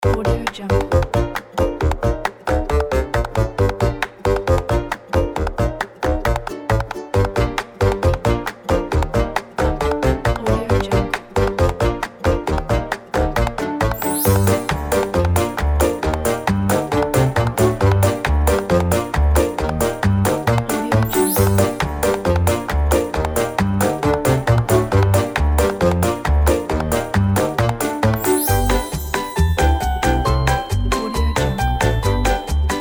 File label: quirky and funny comedy